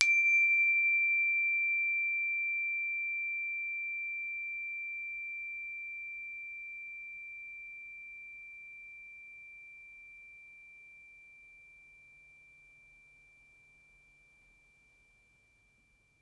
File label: Internal Chimes